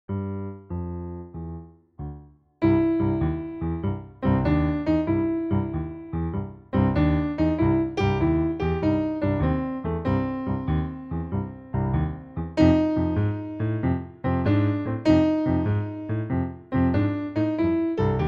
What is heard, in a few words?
blues